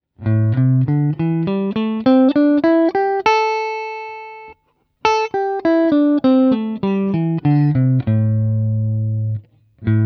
Pentatonic Scale, guitar

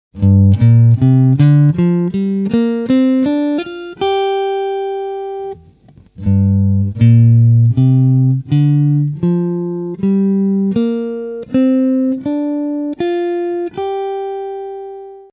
Minor Pentatonic, guitar

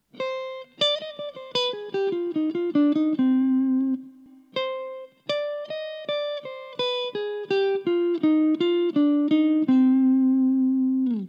Harmonic Minor Lick, guitar

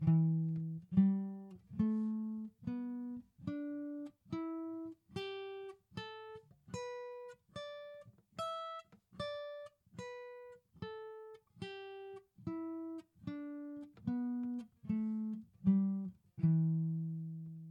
E Minor Pentatonic, guitar

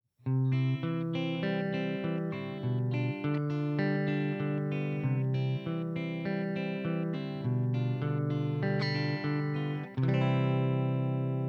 Chicken Pickin; Exercise

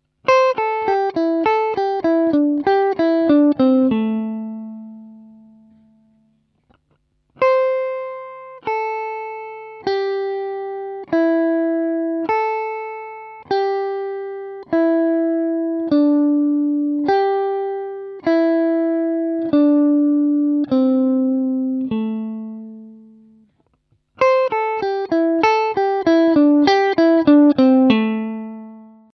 Lick, guitar

